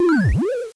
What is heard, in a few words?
Boioing